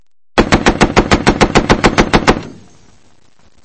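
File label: knocking on the cab of a tanker truck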